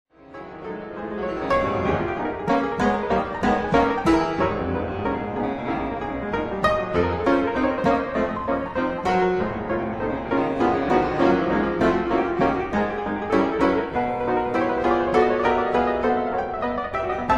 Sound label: Etudes for piano in C minor